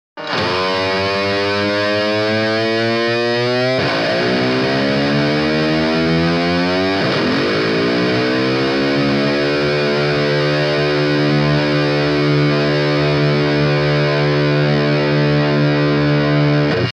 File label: Intro Dive Bombs, Guitar; Donner DST-152W